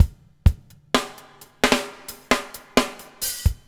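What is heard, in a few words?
BUBBLE left; Spectrasonics - Backbeat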